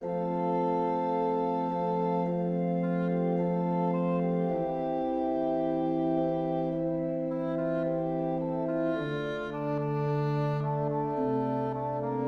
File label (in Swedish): orgel in F